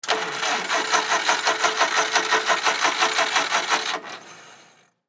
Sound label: Swamp Jeep No Start Stall; Transportation Watercraft; Engine